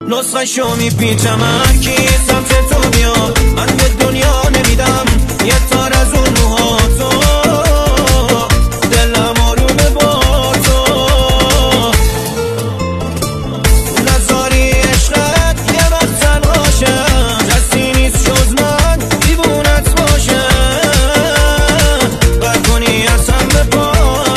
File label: Remix